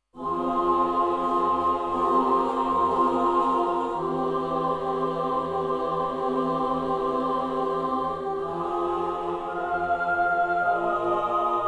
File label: Choir